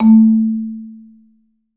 A2, Marimba; Sampleheads - Dave Samuels Marimba & Vibes

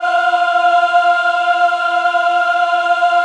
F4 MW AH, Choir; USB Soundscan vol.28; Acoustic & Synth; AKAI

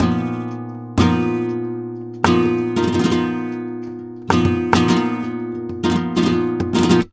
Flamenco, Guitar, Bulerias Y Solea, 193, a#

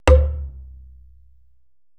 d#6, Procussion Mix, Retro Sampler; Best Service ProSamples vol.55; EMU E-III

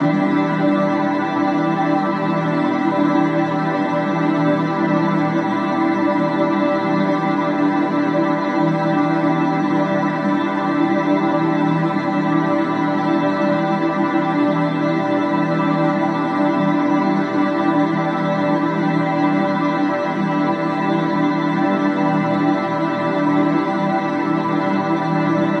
pad loop stasis 150 Emin; Virtual Riot

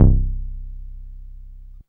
Dance Floor Bass, Multisample Basses

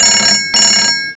DIAL; CMI Sound Library Rev 1.3

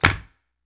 CLAP, Human Perc, Body Perc

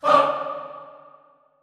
HAH D3, Classical Choir, SHORT HAH; Best Service

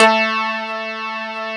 FM BASS; AKAI S-Series CD-ROM Sound Library VOL-7

